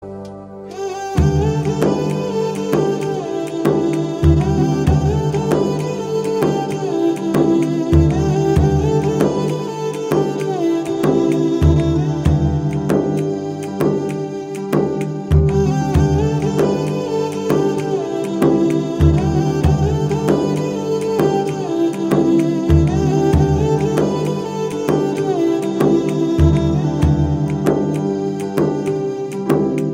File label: Instrumental BGM Ringtone; Kothabangarulokam